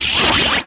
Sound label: shield destr droid deploy